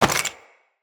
Loot Bag Drop

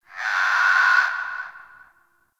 night bird screech; Ambience; Warframe; Eidolon Plains

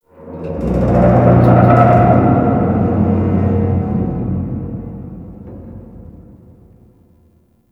Metal Wronk; Props; Warframe; Tau; Ambience

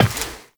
Step Glass, Footsteps, Walk; Warframe; Characters; Tenno

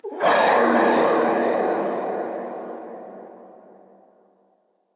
Ghost Attack, Vocals; Characters; Enemy; Nightfold Test Range Ghosts

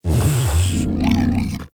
Vocal Breathe Fast

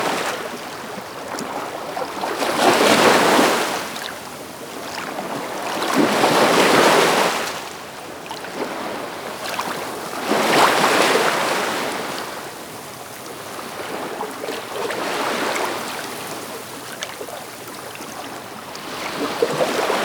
Water Laps Ocean Loop; Ambience; Props; Warframe; Eidolon Plains

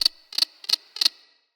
Ext Insect; Midrath Island

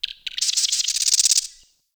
Wren Bird; Warframe; The New War; Zariman; Apartment; Scene Four

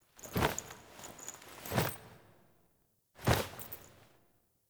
Foley; Cinematics; Lotus Gem Part One; Drifter

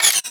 Cargo Scanned Disable Off; Venus Open World; Objective Rooms; Space Port

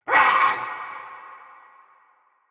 pain, soldier; Barks Battle; Mendicant

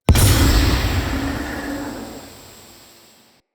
Polluter Eye Hit; Props

